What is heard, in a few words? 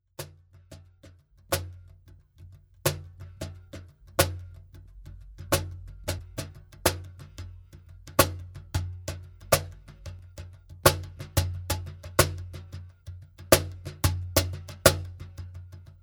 piano, 90 rhythms